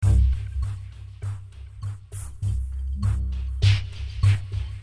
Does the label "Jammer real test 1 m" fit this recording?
No